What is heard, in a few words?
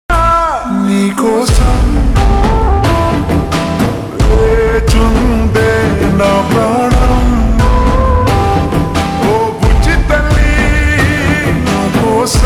Slow Reverb Version Ringtone, Telugu